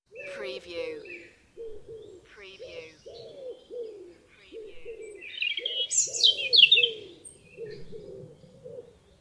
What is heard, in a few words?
UK Birds and Pigeons; beanstalk audio